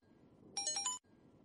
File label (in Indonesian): Nada Dering iPhone WA